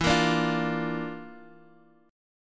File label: Bb Major E; Bb/E strummed; JGuitar